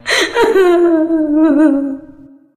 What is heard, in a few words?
walking cry, witch voice; npc; source engine; left 4 dead 2